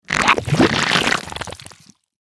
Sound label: Slime Play